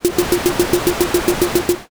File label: gear